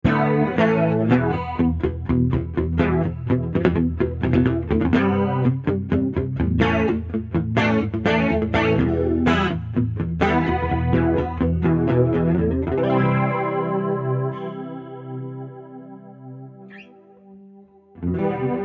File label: Heavy Flange